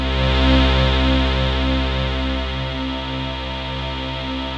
NOIZ SYN; Masterbits; Vintage Voltage; SEQ-FX

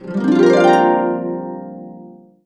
BB, Harps; USB Soundscan vol.62; AKAI

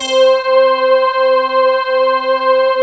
arpichord C5

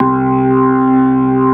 C2, Keyboards Old School; USB Soundscan vol.09; AKAI; SMALL B3

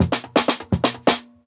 Burble; 90s Sample CDs; Original Jungle Breaks

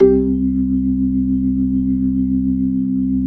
B-3, Jazz

